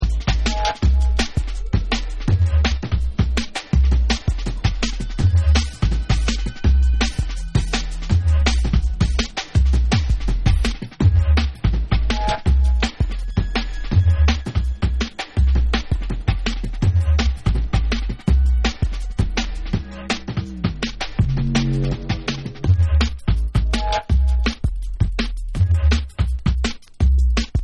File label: Drum Bass